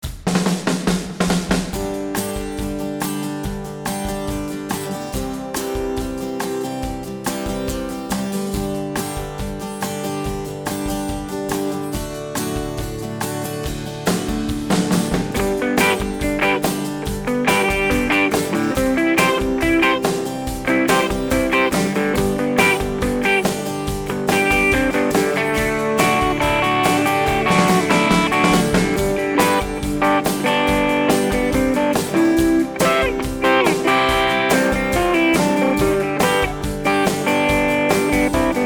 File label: Rock G Major without Bass; backing